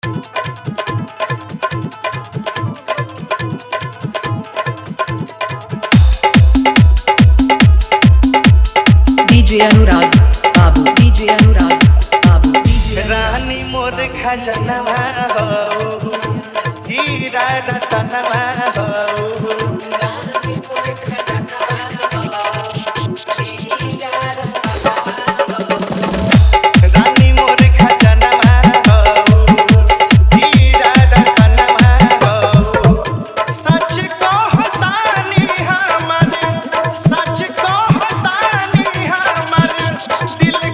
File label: Hard Dhollki Bass Mix